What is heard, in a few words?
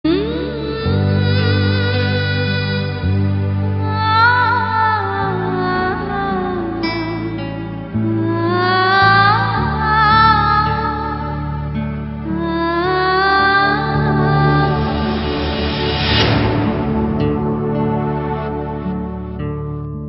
Bckgrnd Muzic